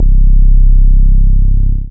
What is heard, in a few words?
BAS MINI, MOOG; Sound & Vision - Gigapack II CD 1